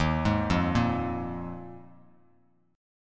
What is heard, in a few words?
Eb Suspended 2nd Suspended 4th Eb Bass Standard, Ebsus2sus4 chord; Ebsus2sus4 arpeggiated; JGuitar